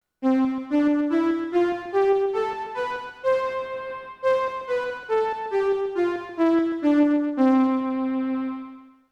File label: sawtooth doremi, MIDI Synth